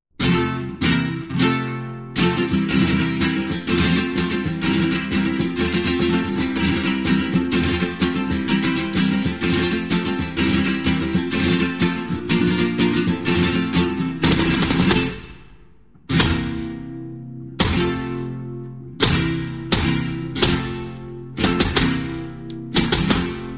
Zapateado, Flamenco; World Music; Spain; The Art Of Flamenco

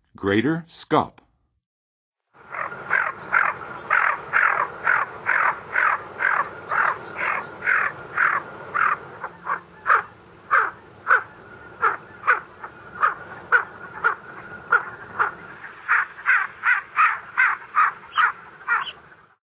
Greater Scaup; North America; Western USA; Western USA Songbirds

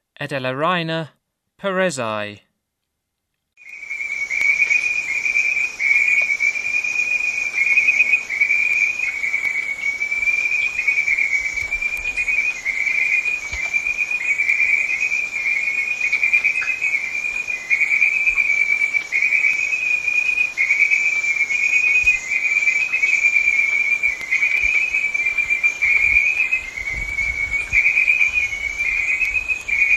Edalorhina perezi, Frogs of Ecuadorian Amazon; S. America

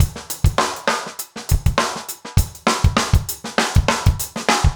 basic funk 100

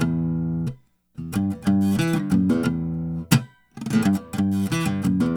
Guitar Emin, Acoustic

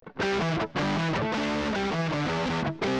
Super Chunky Gtr, Rock, Guitars

